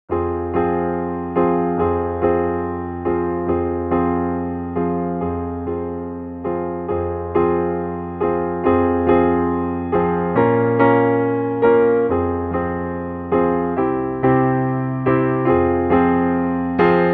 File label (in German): D Saite Uebung Klavier Begleitung